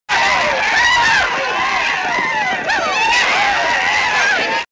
Flea attack loop; Winnie the Witch

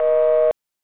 BUSY

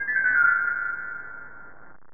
Business Card Toss; Apple PowerTalk Tour; Drop Send

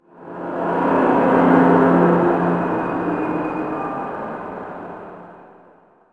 city, zones; Zoom3City

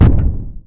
Hit; Deep Fighter

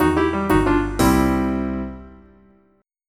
jazz

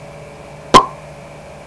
pop, People; Sound Library 2000; Wayzata Technology

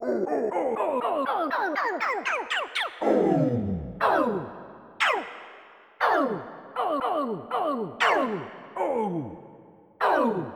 hit; Populous Demo

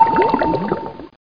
ping; SoundDiver Demo

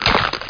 CRUNCH; outlaws

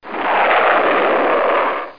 rocket go; DR BRAIN; BUGS

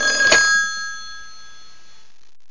phone answer; aroundhome

